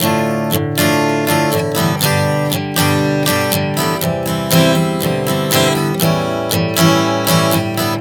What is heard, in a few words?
Dm-G-C-A7 120 bpm, acoustic guitar chord progressions